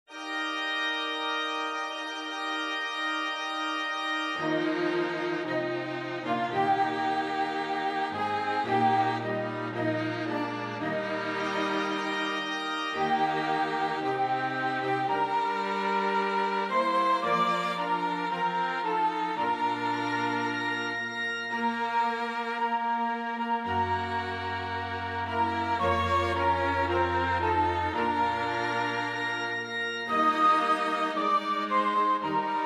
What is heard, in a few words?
God of Our Fathers Whose Almighty Hand INSTRUMENTAL